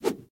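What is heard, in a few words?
short sharp knife swing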